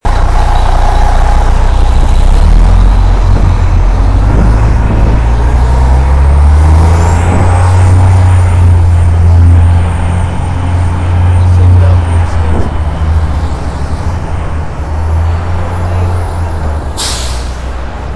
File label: NYC soundscape